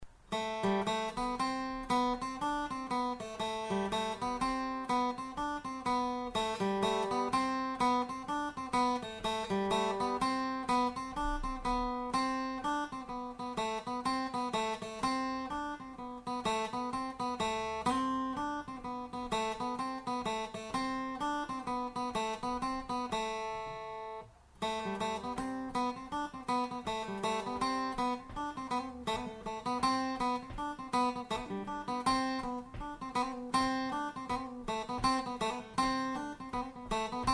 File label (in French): la la le ri melodie guitare